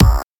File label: crispy bass drum sample A key; kick